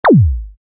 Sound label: synth zap